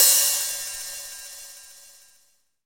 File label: CYM BRSHHH, Brushes; Roland - Rhythm Section